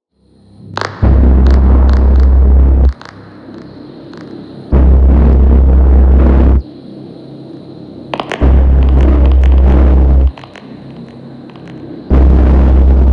spooky techno; weeklybeats 2024

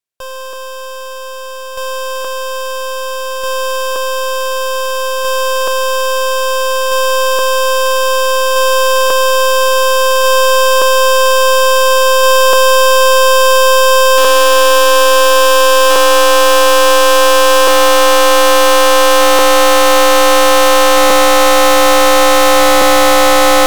noise

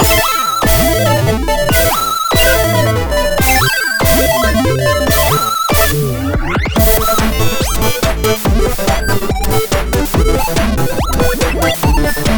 chip opener; weeklybeats 2024

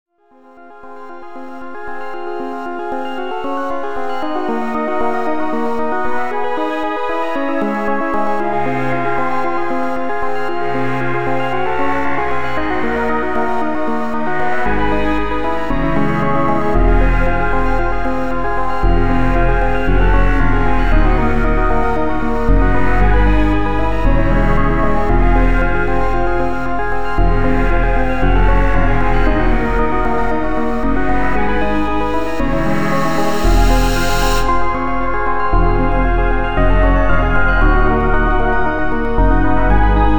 strumbient; weeklybeats-2020

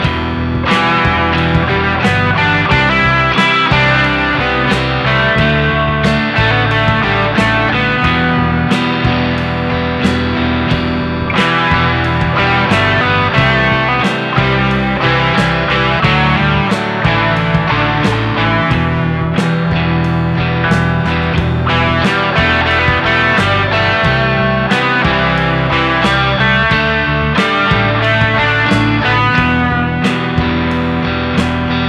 guitar practice; weeklybeats 2024

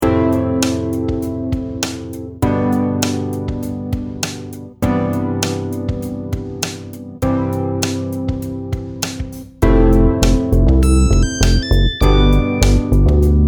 chill trash

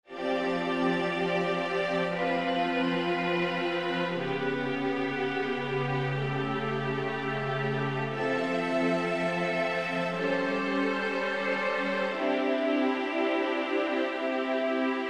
midi sketch; weeklybeats 2024

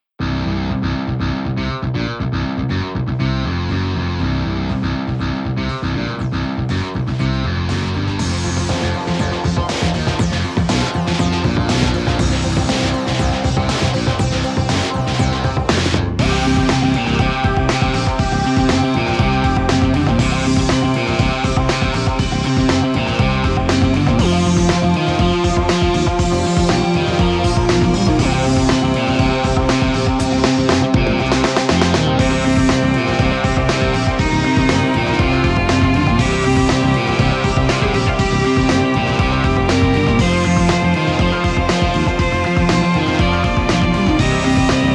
post corporate groove

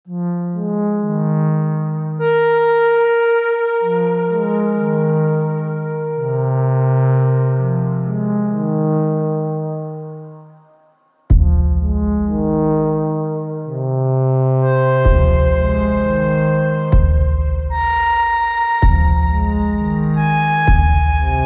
ambient waves

